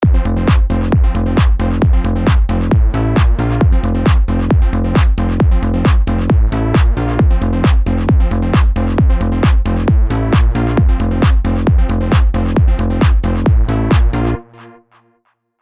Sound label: DISCOTEC